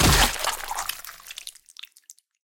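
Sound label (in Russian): выстрел с прямым попаданием в соперника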